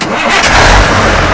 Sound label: turn on, vehicle; tdmcars; kwt800